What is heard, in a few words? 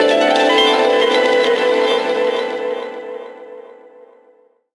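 harp TRAP FX; Rawcutz - Nu Forms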